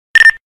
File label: Nextel Ring